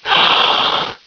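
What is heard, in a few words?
skeleton attack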